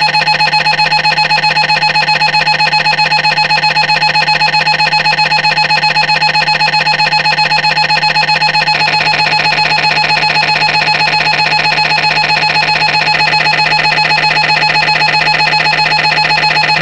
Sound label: MRI Sounds Siemens DTI